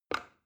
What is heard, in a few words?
plastic button start release heavy